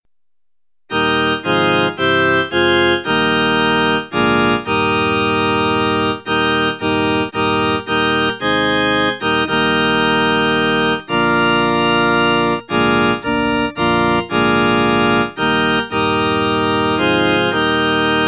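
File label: Whisper A Prayer, church organ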